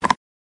common button

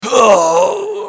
npc scream

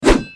swing

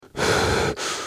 breath pain

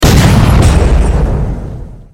destroy exp

